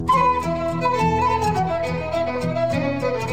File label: celtic sms